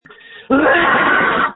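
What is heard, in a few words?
Scream, phone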